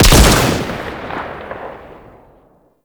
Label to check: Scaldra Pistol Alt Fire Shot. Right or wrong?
Right